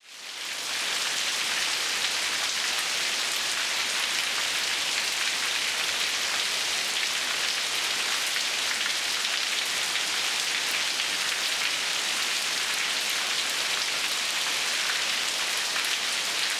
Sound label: Weather Ambience Heavy Rain Downpour Splatty